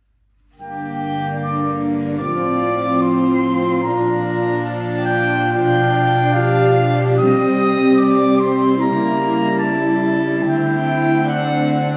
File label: Sax Ensemble Saxophone Orchestra; Pavan; Queensland Conservatorium Saxophone Orchestra